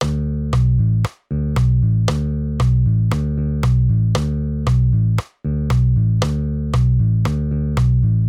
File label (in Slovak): basa rock D